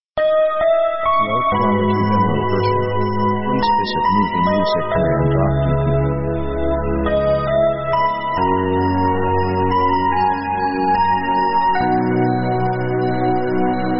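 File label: synth orchestra american melo; synth themes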